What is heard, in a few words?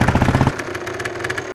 gokart stop; freeman; vehicles